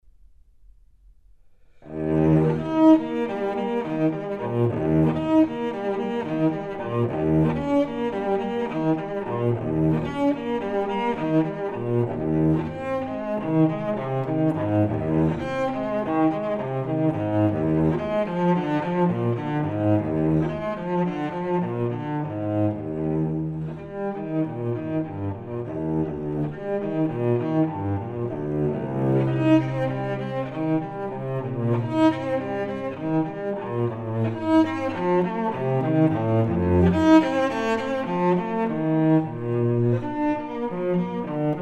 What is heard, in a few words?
Suite for solo cello No. 4 in E; Bach; Cello Suites